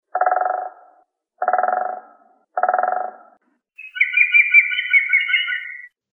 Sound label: Picus viridis; BIOAC AVES